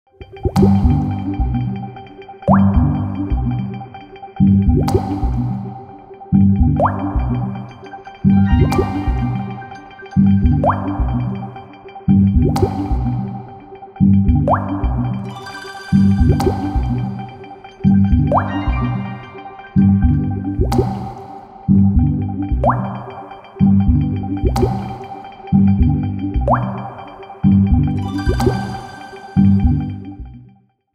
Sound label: Outgoing Ring Tone